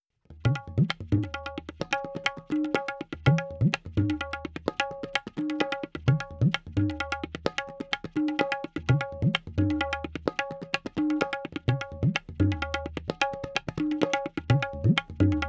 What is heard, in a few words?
Tabla C 4-4 128, Percussion